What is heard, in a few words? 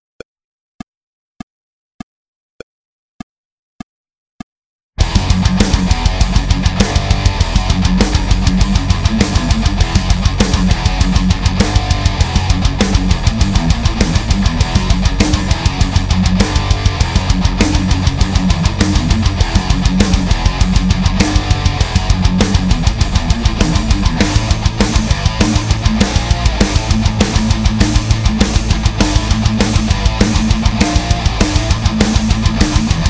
Double Kick Metal